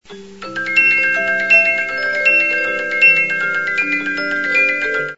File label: The Trout Allegretto, music box 72-Note